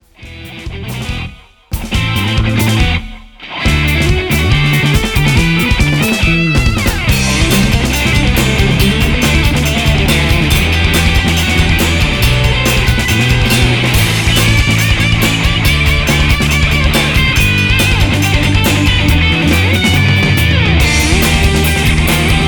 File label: guitar solo